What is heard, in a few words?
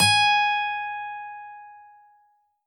g sharp, guitar